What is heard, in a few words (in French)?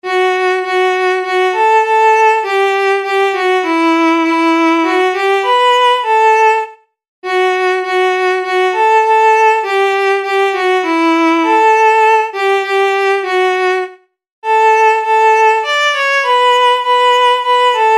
NUIT DE LUMIERE SOP